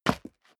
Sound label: step leather shoes concrete grit single stair down; schritte